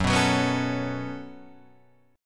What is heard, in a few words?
B 7th Suspended 2nd, F sharp